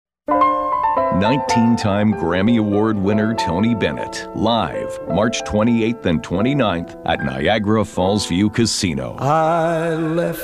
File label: TRAD JAZZ